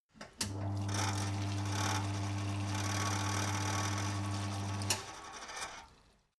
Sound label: mechanic sink water running long